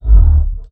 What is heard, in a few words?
trex snort